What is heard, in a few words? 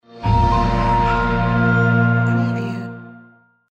Fantasy Logo